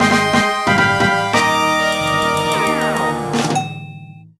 Jingle Clear; let's draw; nds; Copper

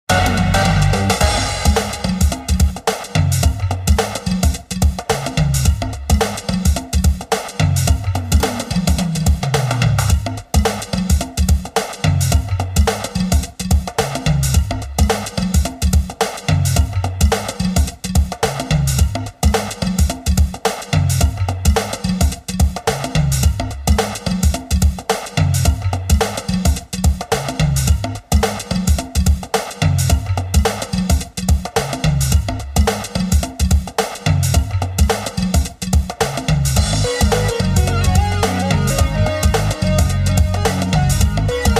Menu; Jazz Jackrabbit 1 2; humble retro bundle

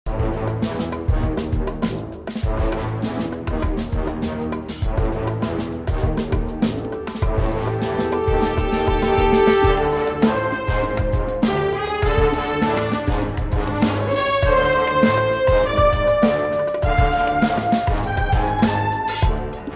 Beat

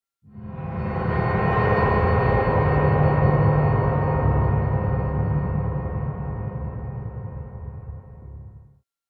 Stinger Decrease Action

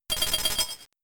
Icy Wind; Pokemon; Gen 3; attack moves; RSE

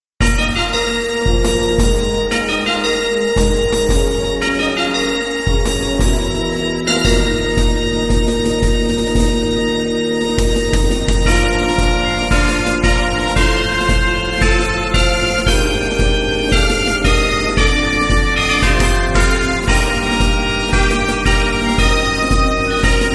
Congratulations!; Super Street Fighter II Turbo; 3DO

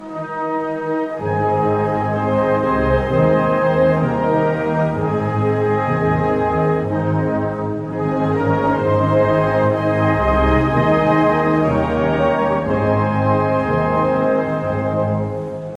Lose; Project Reality BF2 1.6

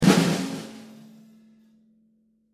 Drums End

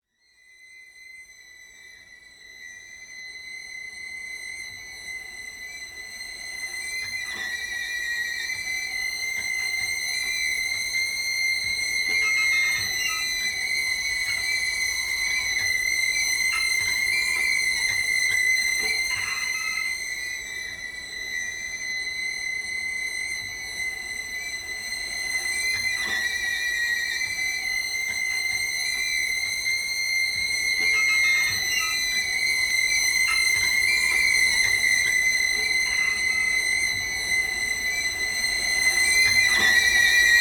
Mine Tension; Outlast 2; PS4 Windows Xbox One

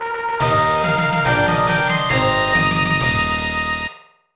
Clear Level; Jungle no Ojya Tachan; SNES

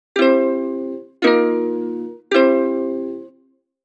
Ukulele Trainee; Opoona

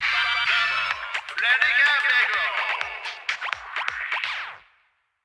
Radio Jingle

